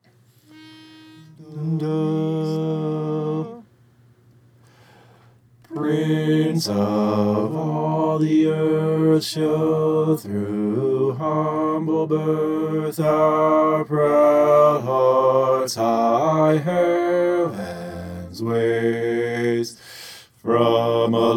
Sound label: bass